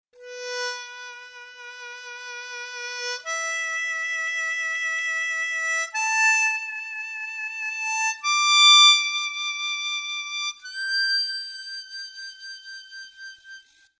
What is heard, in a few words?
Effects, harmonica